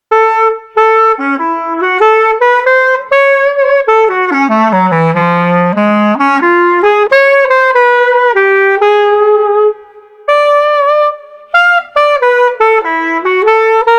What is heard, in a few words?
Street Clarinet Lighthearted 94